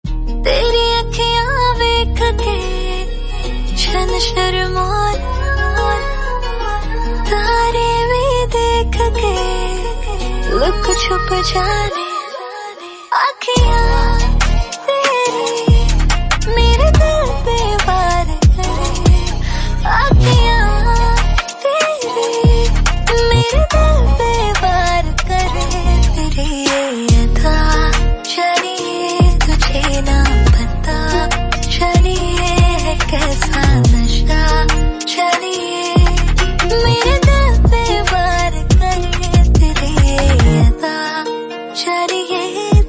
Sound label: romantic song